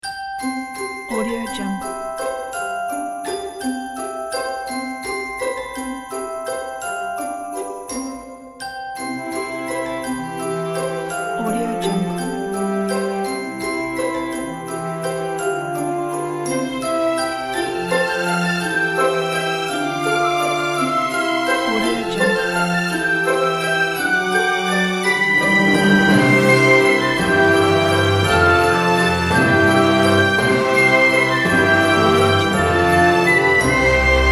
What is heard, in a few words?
christmas jingle